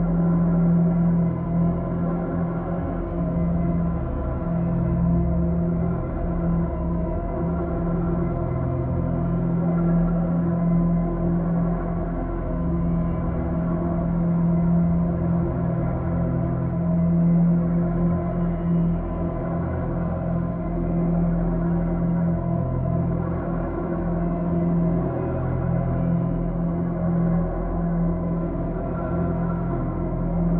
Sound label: ship juggernaut idle; ships; Stellaris; shroud